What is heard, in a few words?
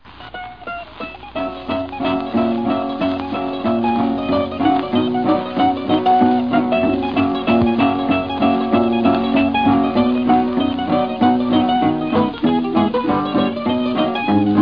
Mandolin King Rag